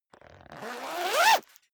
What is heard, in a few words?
zipper down jacket open accelerate; objekte; reissverschluss